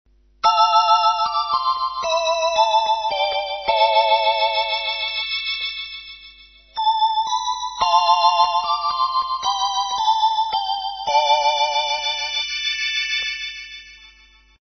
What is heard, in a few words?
Sustain